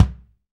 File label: BD, BD REAL